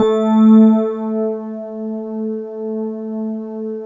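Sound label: GLASS Rhodes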